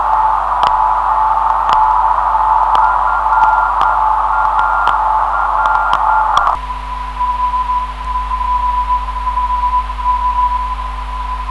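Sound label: 400 Hz, non-directional beacon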